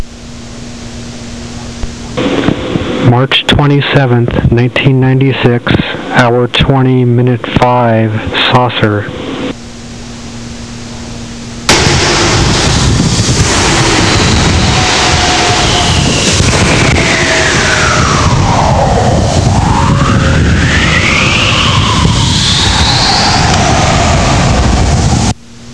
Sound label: saucer